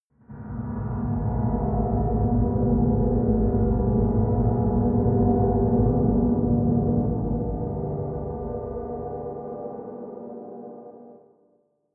atmospheric drone pad sub; musikelemente; drones

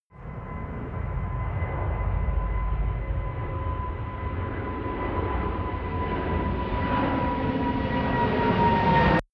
plane incoming land; flugzeuge verkehrsflugzeuge; verkehr transport

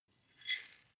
herbs tilt fast; soundpool

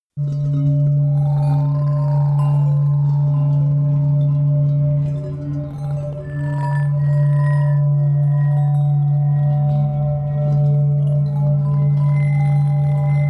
wicked wind chimes; flaechen

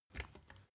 step ladder wood single

